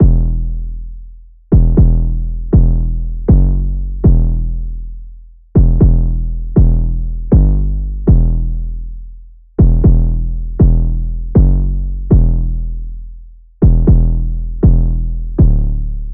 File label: 808 loop 119 Em; Slate Digital BASSHOLE Sample Pack